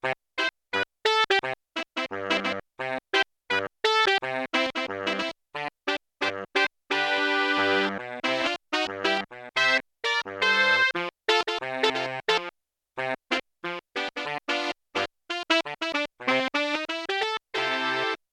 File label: Alto Sax Trio; Alto & Soprano